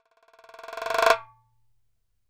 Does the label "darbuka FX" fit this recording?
Yes